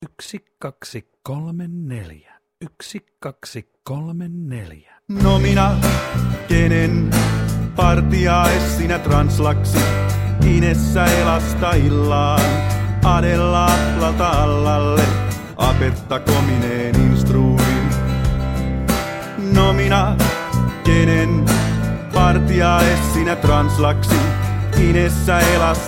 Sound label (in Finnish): rummuilla; alkulasku; sijamuodot